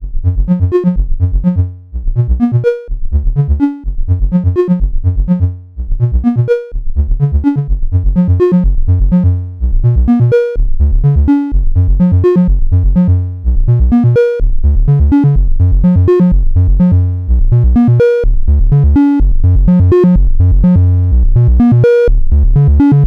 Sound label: TRIANGLE pattern